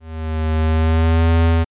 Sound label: Solarpanel; ld31 space diggers